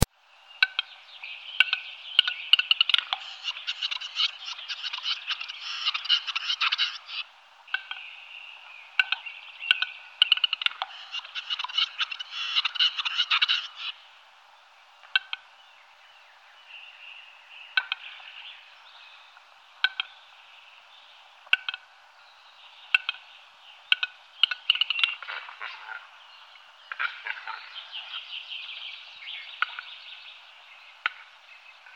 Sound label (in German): Auerhahn Balzlaute; Auerwild